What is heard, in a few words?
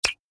UI Menu Close; One Shot Fishing